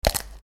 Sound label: Frozen Veggies; Bunnicula - Crop Control